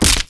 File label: hit flesh; battle